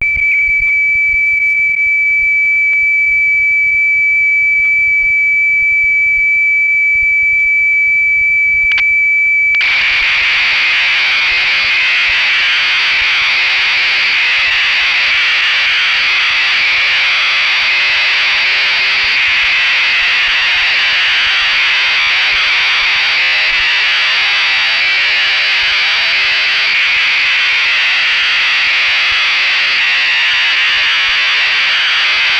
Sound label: Oberheim DSX factory presets, Cassette Interface; Synthesizer Factory Patches